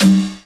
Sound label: Perc